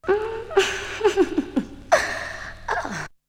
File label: Vocal; ZERO G - Datafile DISC 2